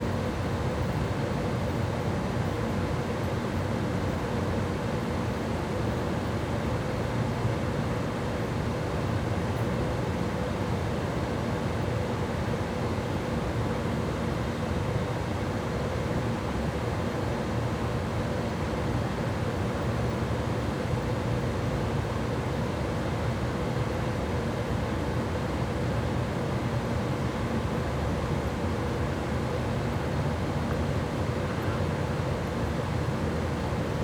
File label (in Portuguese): Aquecedor de piscina com morcegos a noite; colecao sonora do cerrado